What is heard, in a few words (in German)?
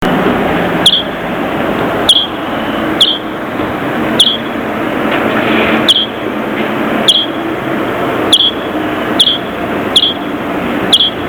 junger gruenfink